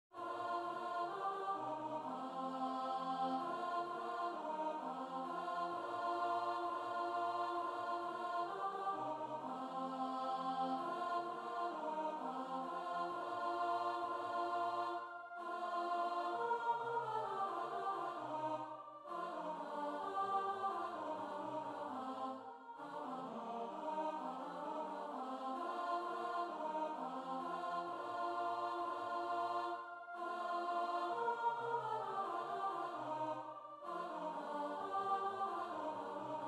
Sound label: altos; Ding Dong